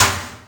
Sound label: TRASH, INDUSTRIAL